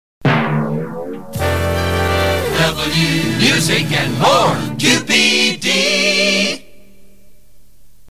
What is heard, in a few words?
jingle Music and More; WQPD